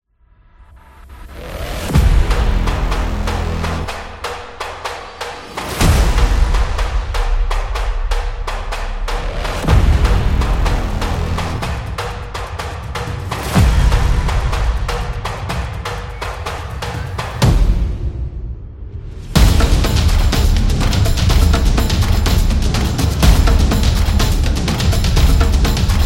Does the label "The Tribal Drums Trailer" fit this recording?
Yes